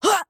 Ruins Flying Sentry Attack

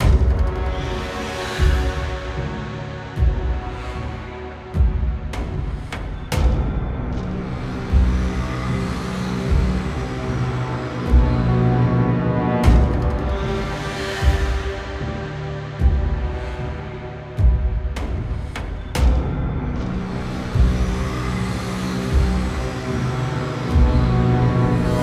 Loop

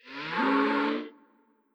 Bull

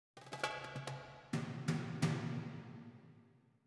Perc

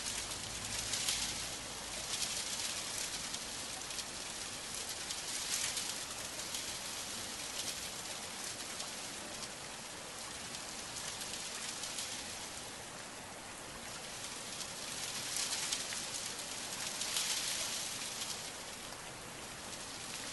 Window Rain Audio; AmbienceSabaku

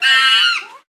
Monkey; Rabbids Multiverse

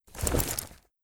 Armor Pick Up

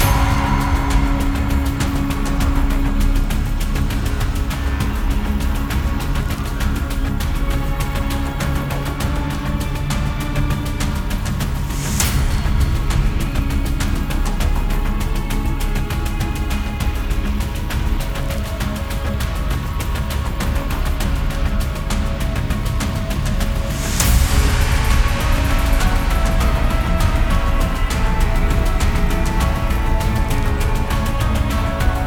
Battle

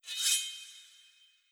Sword; Skill Barbarian

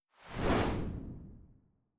Hawk Take Flight

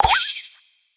Death - Jump

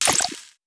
Projectile Burst

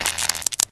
Toaster; gamerip

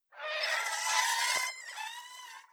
Hydra Vo; Legend of Keepers

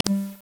Switch Activate

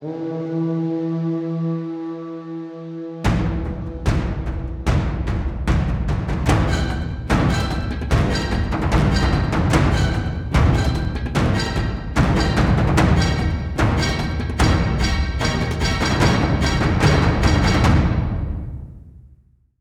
Music BGM RockChasing; Wenjia

